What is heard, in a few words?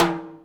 Md W Verb, Alesis D4 drum machine